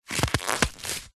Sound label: plastic cellophane thin wet squeeze squeak creak; foley